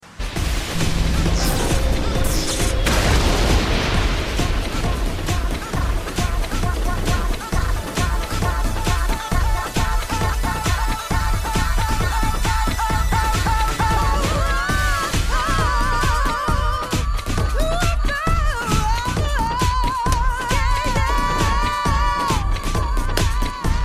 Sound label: Cool Tone